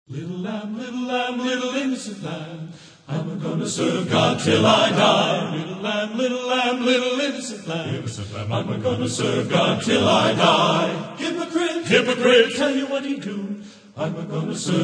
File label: Men's Choir